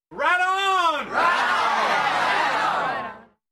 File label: Crowd in protest